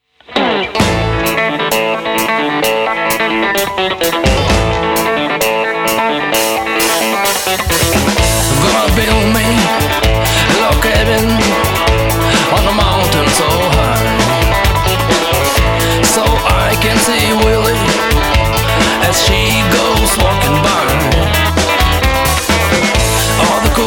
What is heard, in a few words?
Country Cuckoo Bird